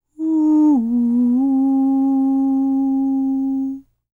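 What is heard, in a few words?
falsetto, Vocal Planet Jazz FX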